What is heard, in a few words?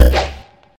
bow Sound; BattleBuddies